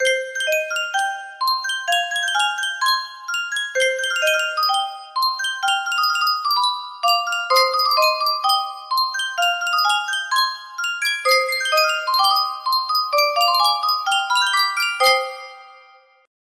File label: Yunsheng custom tune music box